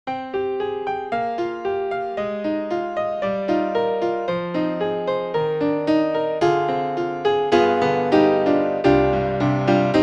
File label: Kontakt Grand Piano; Native Instruments; Kontakt Factory Library(Band)